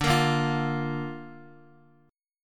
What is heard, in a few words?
D Minor Sharp 5th, D, down, Guitar; Dm#5 strummed; JGuitar